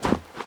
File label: snow step; Golden Crayon Sounds